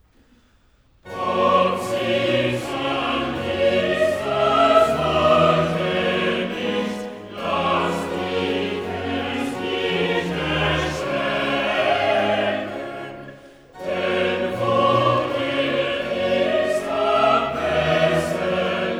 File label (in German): Choral; Cantata, BWV 155 'Mein Gott, wie lang, ach lange'; Bach; Greenwich; 2nd Sun After Epiphany